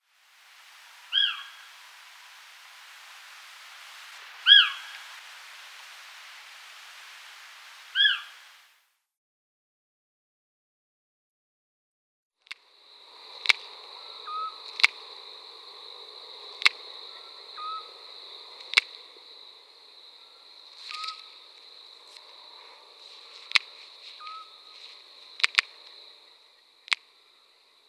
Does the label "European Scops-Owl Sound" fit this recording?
Yes